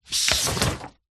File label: paper sheet a4 pull slide out from under another; zapsplat